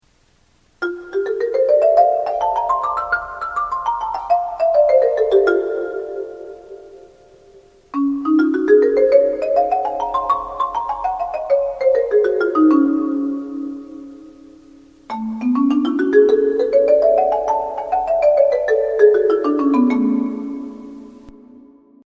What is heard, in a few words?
scale set